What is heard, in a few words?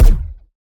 Ball Player Hand Hit Extra Hard